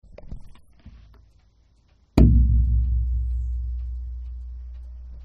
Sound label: skin sound, gourd